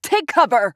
Mage Taking Damage; Kunoichi; Realm Royale

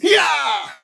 Grunt Attack; Paladins; Bomb King

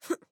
Mage Grunt Fast; Radon Flux; Realm Royale

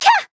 Death; paladins; Alchemist

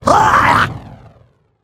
Heavy Attack; Battlerite; Igniter

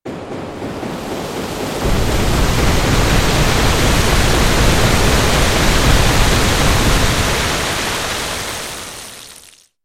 Torrent Storm; Kunkka

